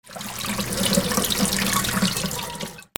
KITCHEN

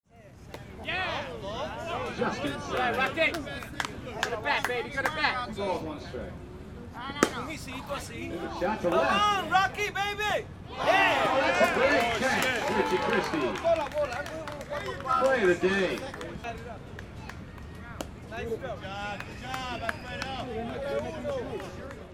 GAMES

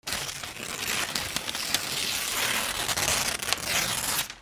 RIPPING

